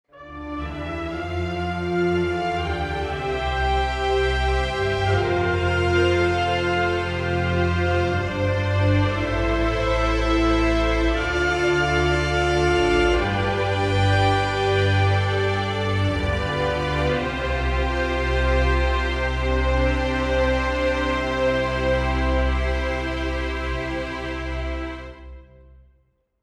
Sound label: Legato Sustain; Albion